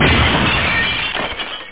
klirr; Aminet 21; GTI - Schatztruhe; 8,363 sample rate

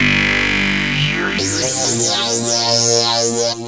vibrator schlaff

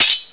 Schwert; Anno

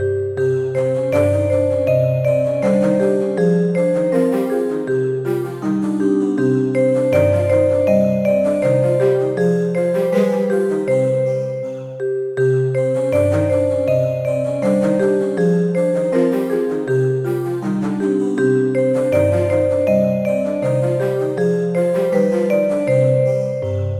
MUSIK

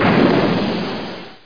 bumm

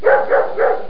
Hund bell

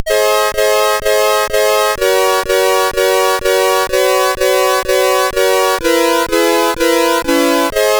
Akkorde, SID tunes

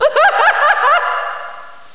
Lachen; Sexscape 2

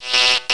elektrik

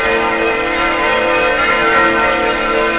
kirche; spiele spiele spiele topware

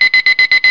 Wecker

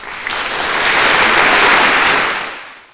klatsch

